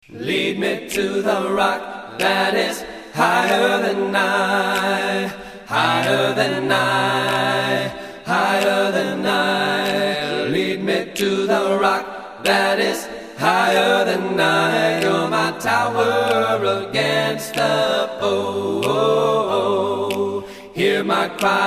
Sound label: Lead Me to the Rock